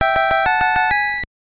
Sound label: Bonus Round Start; nuts milk; nes